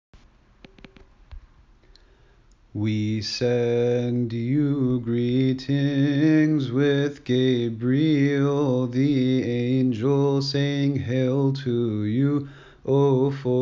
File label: Kiahk Gospel Response